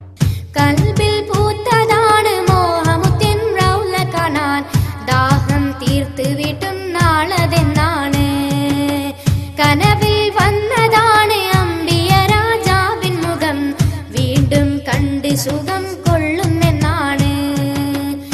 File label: Tamil Ringtone; Islamic